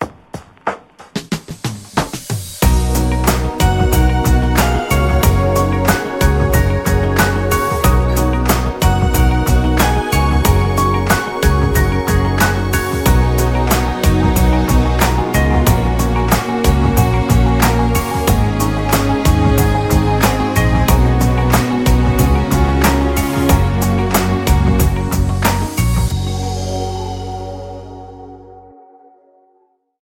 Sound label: Lounge, ringtone